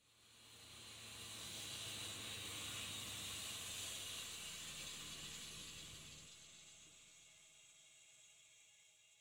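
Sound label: Aircrafts A-10 APU End Cockpit; DCS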